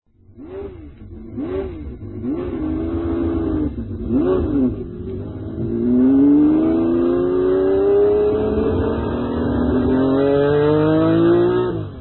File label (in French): ferrari 312P au volant